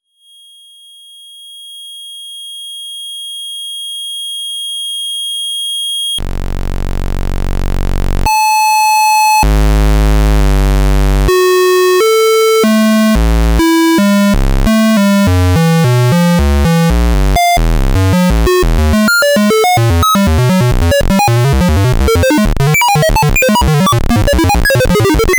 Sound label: Sample Rate Range; analogsynth